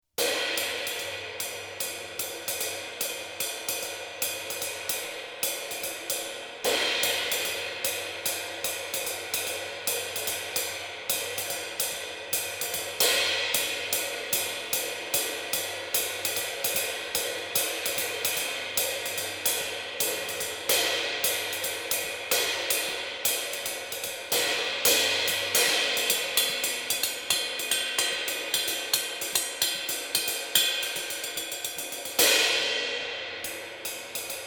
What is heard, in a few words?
Zildjian K Custom Complex Dark ride 21